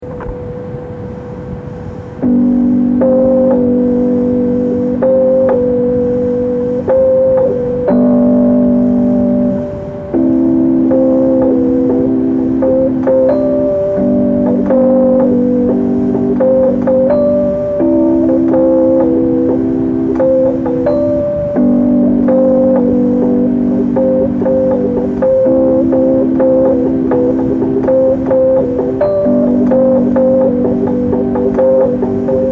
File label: rhodes instrumental